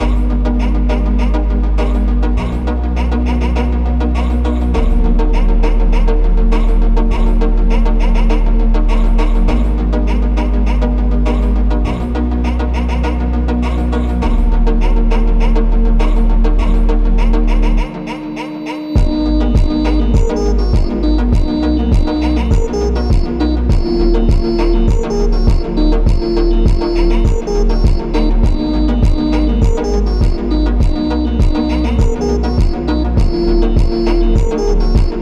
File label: funk slowed